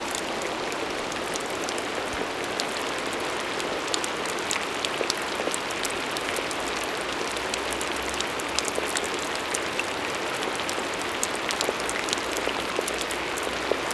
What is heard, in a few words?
White Noise Raindrops